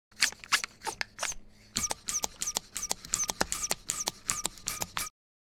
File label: Bicycle air pump